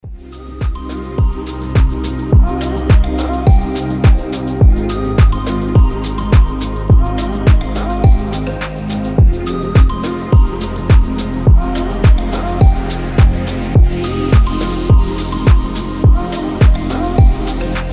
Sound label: deep soul; tantsevalnye